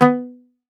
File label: Greek Bazouki, Plucked Strings